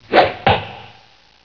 ARROW

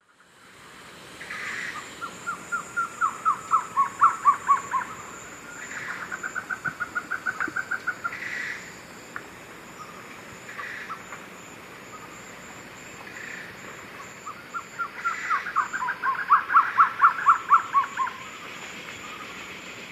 Trogon curucui, songs 2 birds; S. America; Eastern Lowlands

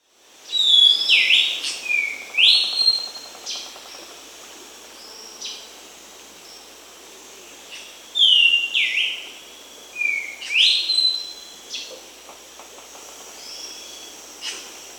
Greater Schiffornis (Schiffornis major), Peru; S. America

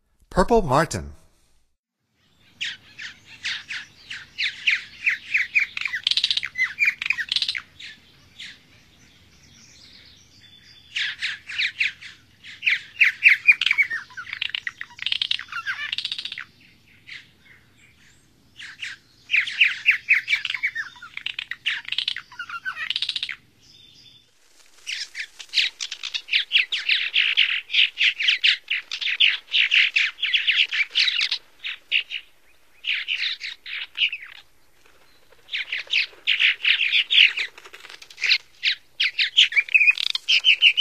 Purple Martin, Songs of Birds of North America; Stokes

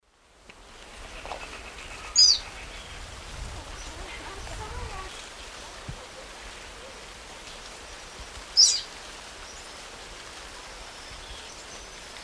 Sporophila castaneiventris, call; S. America; Eastern Lowlands

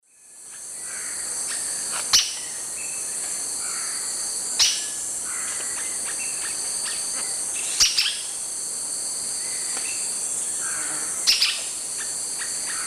Automolus infuscatus, calls; S. America; Eastern Lowlands

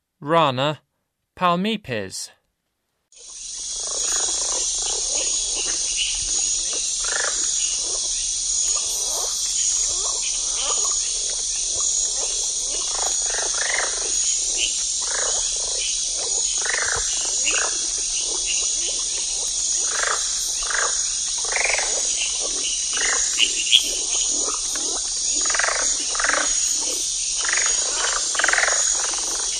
Rana palmipes, Frogs of Ecuadorian Amazon; S. America

